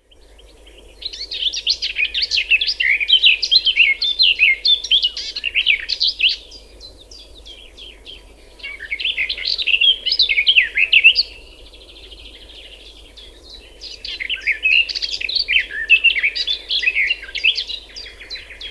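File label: garden warbler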